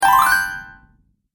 App Game Spell, magic; Magic Sound Effects

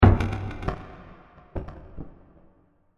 SciFi Hit With Short Delays; SciFi Impact With Scratches; Blast Sound Effects; Blasts (Sound Effects:Impacts)

